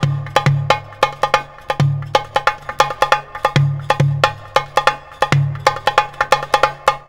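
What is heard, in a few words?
DARB, Oriental; Best Service; Ethnic Journey Sound Cube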